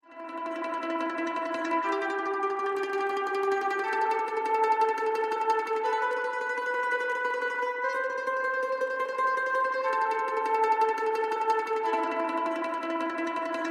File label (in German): Mandoline